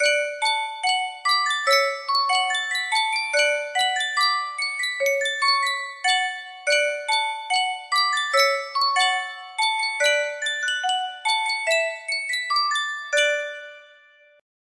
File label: sankyo music box, melody